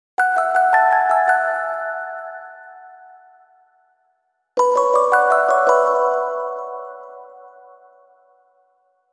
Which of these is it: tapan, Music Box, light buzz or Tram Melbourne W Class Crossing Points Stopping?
Music Box